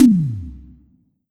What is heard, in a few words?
Korg NS5R Synth Drum